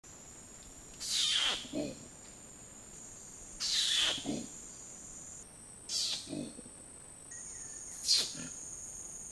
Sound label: Grumph